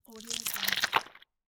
fleshy transformation

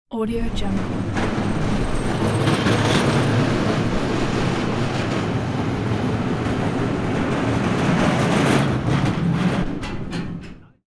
chain industrial transporter moving on rails

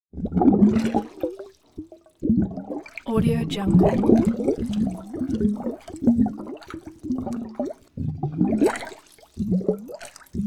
underwater bubbles bursts constant at tail

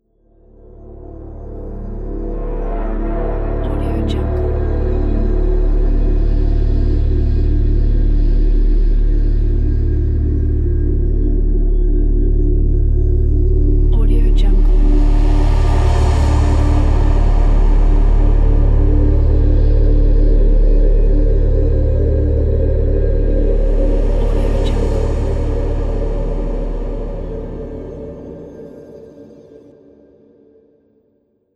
ambience wind texture

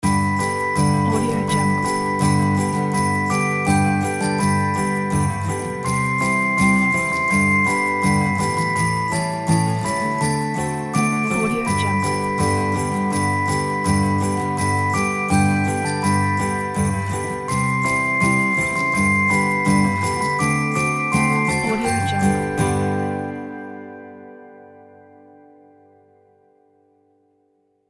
jingle bells acoustic guitar logo

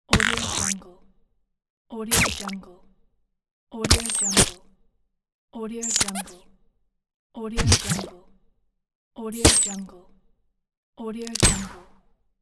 insect squishes; creatorium-sound-design

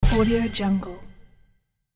fantasy coin hit

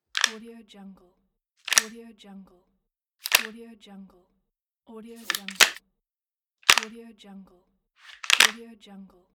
pistol gun grab

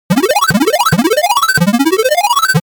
game fx scoring; smartsoundfx

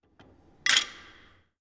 weight set on rack

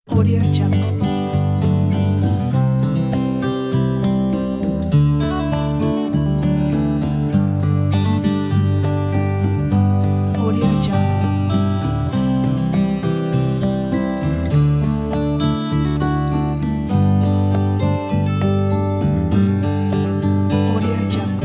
front porch feeling

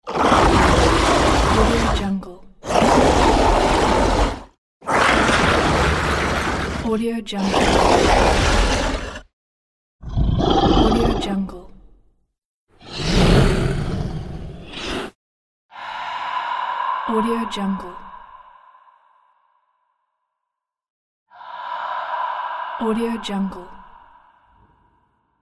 halloween monsters pack

